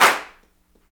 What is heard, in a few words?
CLAPS, Africa Handclaps